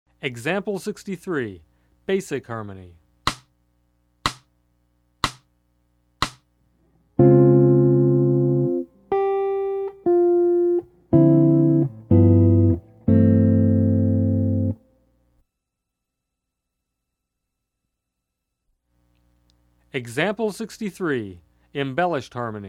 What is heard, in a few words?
Jazz